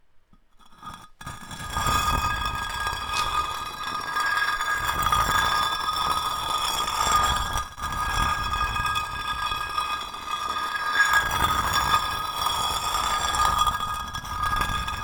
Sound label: Brick Dragged On Concrete Floor